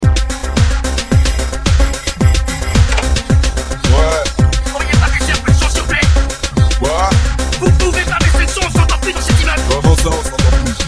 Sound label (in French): Tapage Sonore